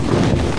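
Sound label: tackle slide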